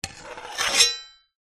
warfare sword medieval heavy draw scabbard

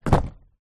cardboard box down on carpet

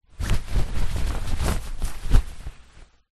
polyester shorts put on; foley

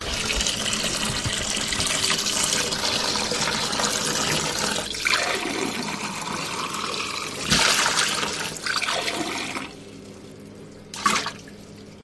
pub glass out in rinse sink; field reports

